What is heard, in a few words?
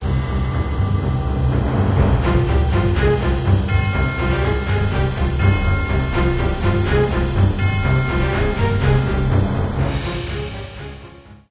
Museum theme, music